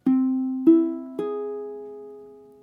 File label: chord cegis, ukulele